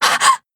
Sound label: gasp; rin